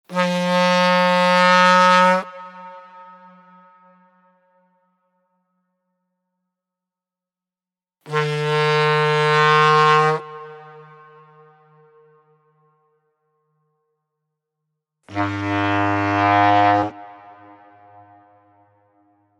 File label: 3 x blasts from a Foghorn/Ship horn; Deep Tones; Sound Design